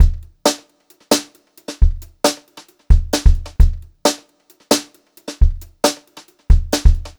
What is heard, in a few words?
Brushed Drum Loops